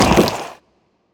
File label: SWARM ENEMY death; Swarmfeeder